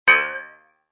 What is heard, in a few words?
fun button; Cars; tractor tip